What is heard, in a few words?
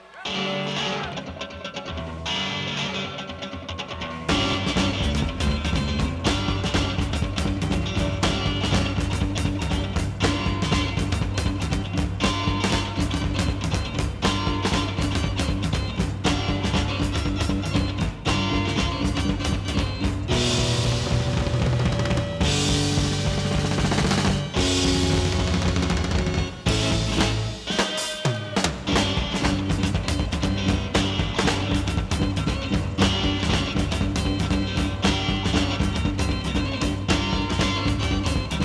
(instrumental)